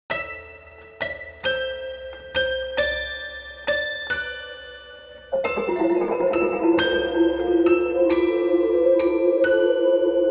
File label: toy piano; Muzyka Ilustracyjna